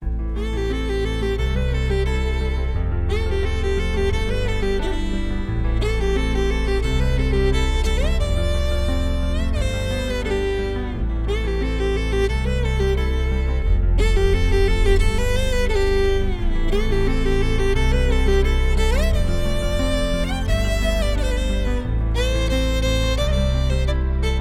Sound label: Emotional BGM; K-RAMP